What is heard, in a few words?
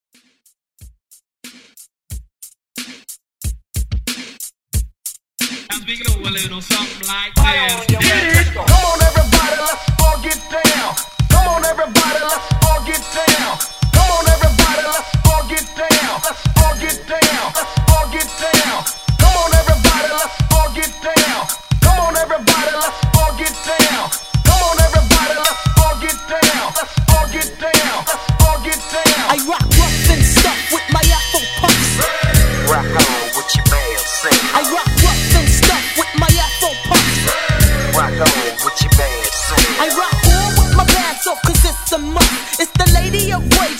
Old School Redrum Hype